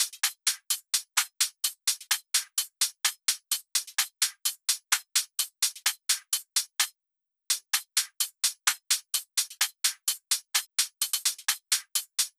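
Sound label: Drum Loop 128BPM hi-hat; VEC5; Drumloops; Multi Loops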